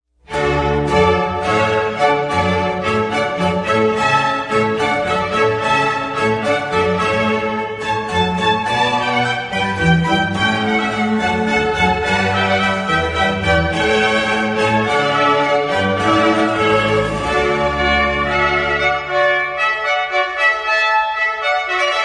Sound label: Hornpipe, Chamber Orchestra